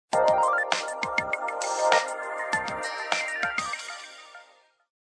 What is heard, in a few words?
Missed Call; KV500 Cookie